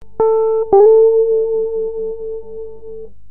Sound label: slide